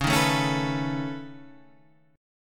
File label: C sharp 7th Suspended 2nd Sharp 5th C sharp down